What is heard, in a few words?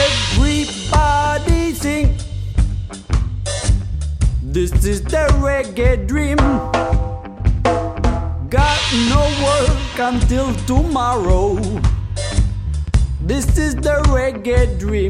Reggae; Music Delta